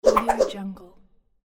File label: acrobatic spinning whoosh